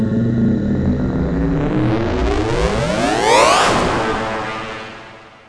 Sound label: roll